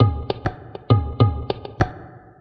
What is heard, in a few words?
Baladi al Maqlub Tabla; Sonic Foundry (Sony Creative Software); Myths of Technology; Acoustic Beats